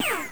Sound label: hit; madcars2; radicalplay